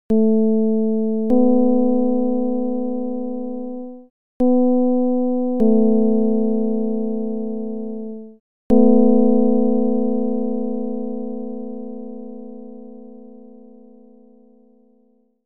csound foscil 220hz; Ji 256 225

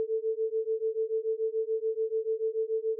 Autogenerated sine 120 cents

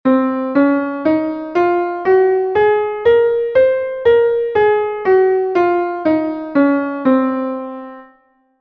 Locrian (19-EDO)

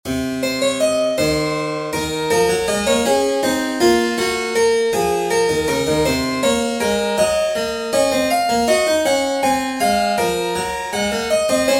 Diasem Locrian Example 31edo

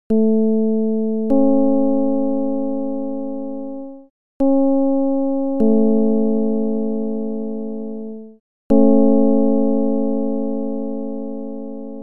csound foscil 220hz

